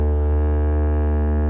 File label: didge; hobgoblin